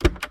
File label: deck open